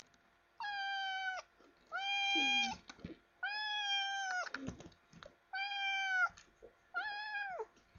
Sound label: meow